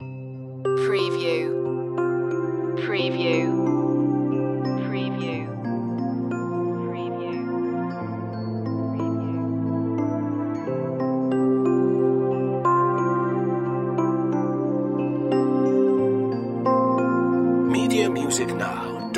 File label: angelic healing loop